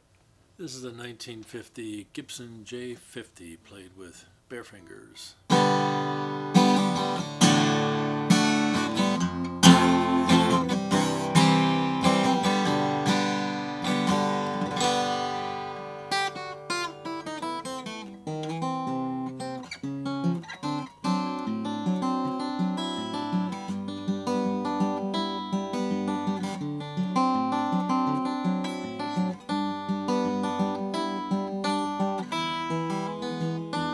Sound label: gibson j50 natural; 1950